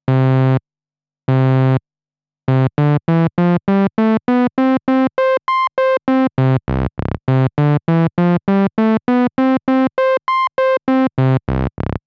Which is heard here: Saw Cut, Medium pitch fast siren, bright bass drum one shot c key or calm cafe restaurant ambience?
Saw Cut